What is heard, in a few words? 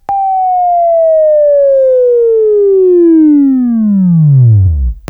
Buzz; Labview-Program; Sweeping Training program